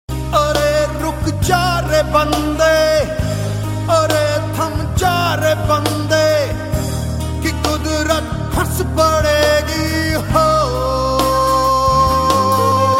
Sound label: Indian Song; Bante